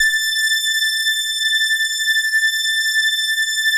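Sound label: OBERHM OBXA, Oberheim OB-Xa; Big Fish Audio - First Call Vintage Keyboards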